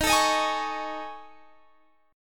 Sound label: A Major 7th Flat 5th